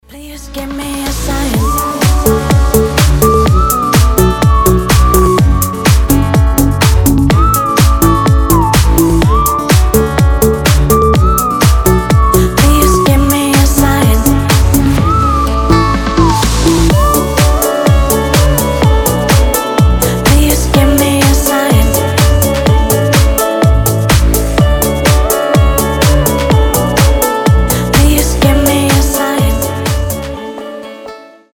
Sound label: summer whistle